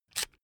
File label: electric drill template adjust heavy out; maschinen; bohrer; soundpool